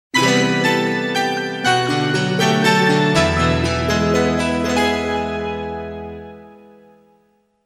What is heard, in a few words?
battle Failed; Tap Knight; android